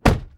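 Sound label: car door close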